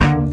orchestra string hit D

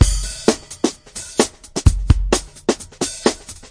129 bpm subtle bright break

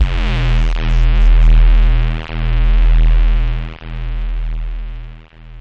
storm bass; Basses

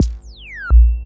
subgliss dubba hit, electro FX

